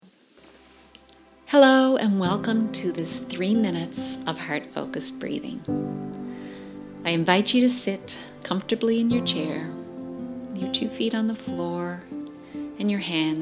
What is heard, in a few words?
Heart Focused Breathing; 3 Minutes of Heart Focused Breathing